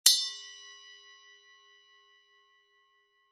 FX 6in Zil Bel Small, cymbal

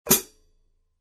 14in Quick Beat HiHats, cymbal sound

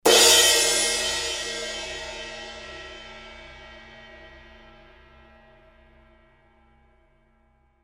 20in Custom Hybrid Ride, cymbal

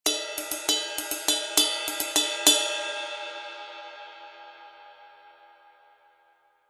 20in Custom Ping Ride, cymbal sound

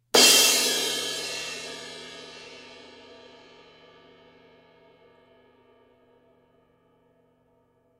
A Avedis Crash

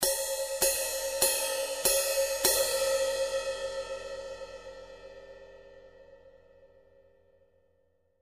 Crash Ride, cymbal; ZBT 18in Crash Ride

